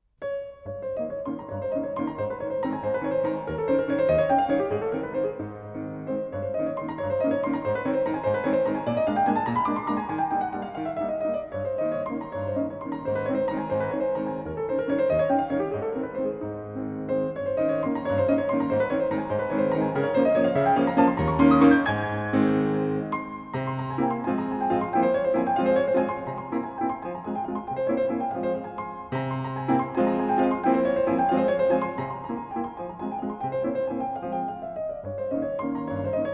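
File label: Waltz in F flat, piano; Waltz #16; Op. posth; CHOPIN; Nikita Magaloff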